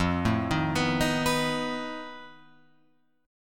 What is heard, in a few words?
F 7th Flat 5th F down Guitar Open F; F7b5 arpeggiated